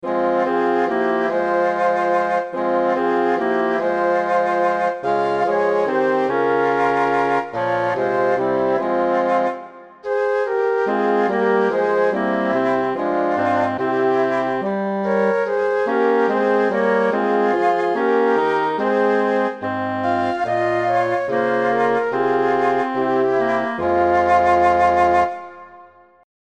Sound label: satb; brahms rosmarin